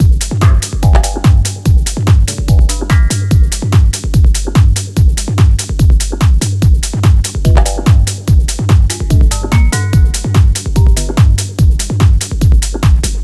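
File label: 145BPM G min, groove techno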